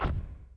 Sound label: spirit move; FlyForInsanity